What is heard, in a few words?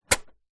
CD Player Close